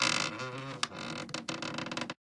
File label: entrance; Golden Crayon Sounds